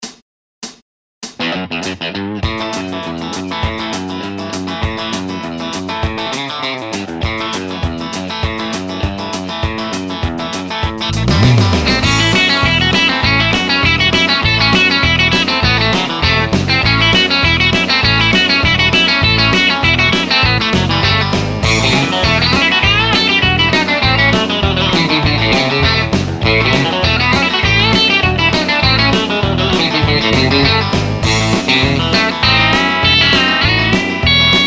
50 licks country

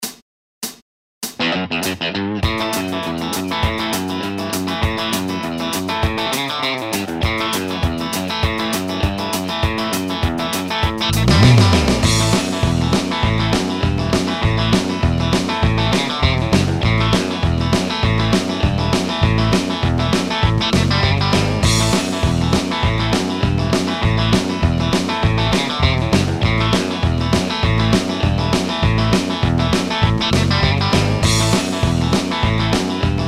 licks country

